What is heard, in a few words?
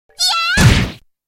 Girl A Shout